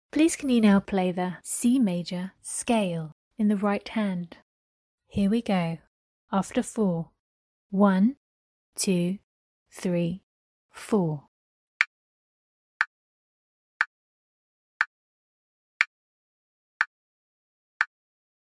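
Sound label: C major scale right hand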